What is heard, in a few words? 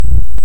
Hydrophone; OneOcean